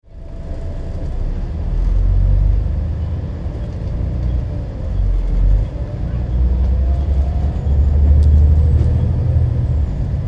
Bus Interior - London transport bus - rush hour - stops starts - voices of passenger; voices of passengers; Busses; Vehicles; Tom Hutchings